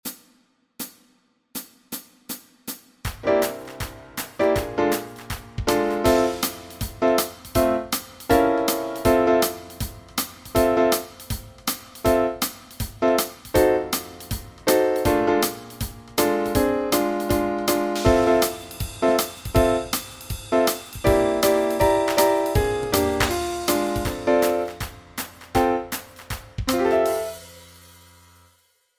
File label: Backing Track, walking bass